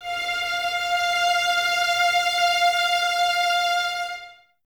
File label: violins F 5, String Ensembles, 23 Violins; Miroslav Vitous